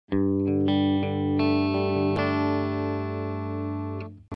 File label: Electro-Voice Cobalt11 - Clean (Bridge+Neck)